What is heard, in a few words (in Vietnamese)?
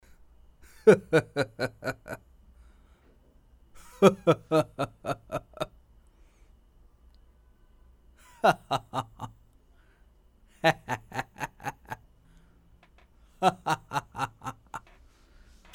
Tieng cuoi nham hiem va gian xao